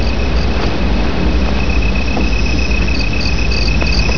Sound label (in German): ZIRP